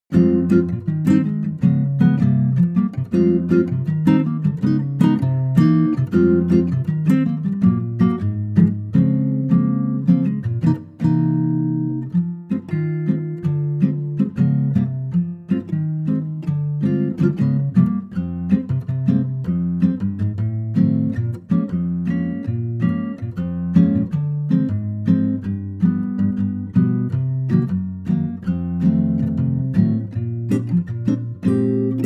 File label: Fm Ac Guitar